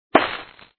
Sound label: snowball hit; Raft Wars